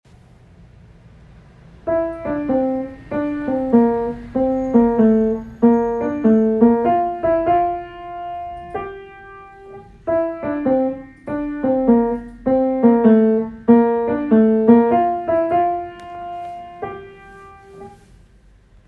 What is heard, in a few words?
Piano; Hoc Chorus